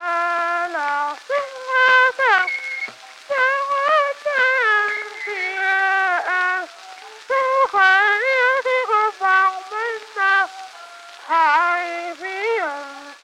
An unidentified folksong sung in the tune of Mengjiangn